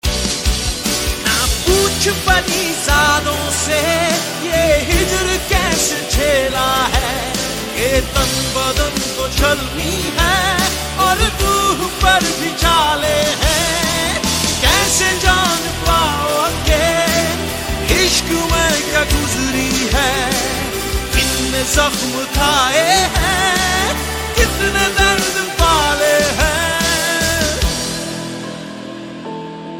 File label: OST pakistani ringtone; Parizaad